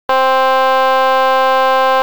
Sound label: trumpet, tremolo